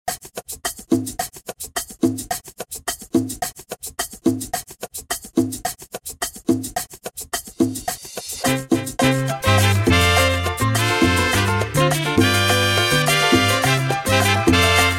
SALSA RMX

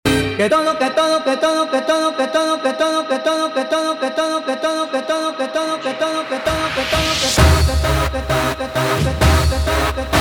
guaracha remix 131 bpm

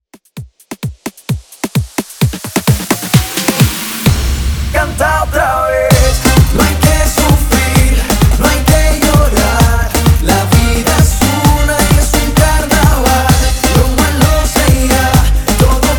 Carnaval Mambo Intro Break 130BPM

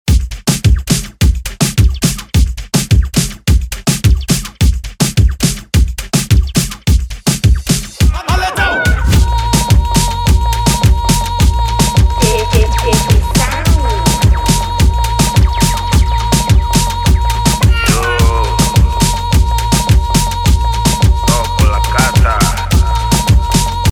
remix bpm 106

